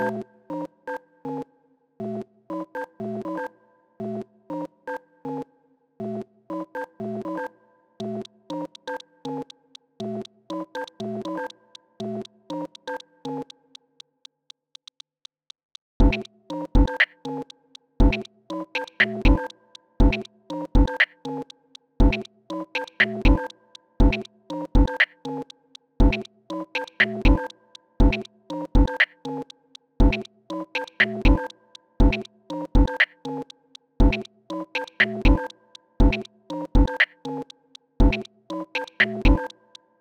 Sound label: Sine Wave